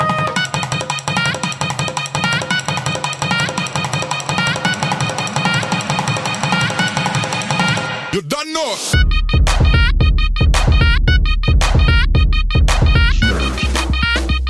Bass Ringtone

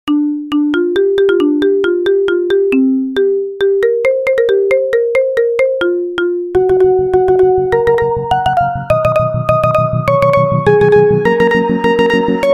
Marimba iPhone

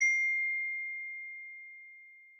iPhone Notification; toque de celular

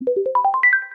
iPad Notification; toque de celular

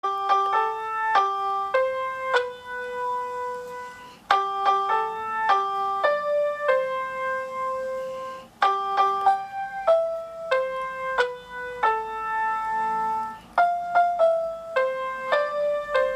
iPhone Happy Birthday Tune; toque de celular

